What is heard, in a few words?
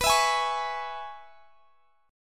Db Minor B; strummed; Dbm/B